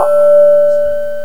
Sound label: Tinkle Bell, Percussive; GMPlay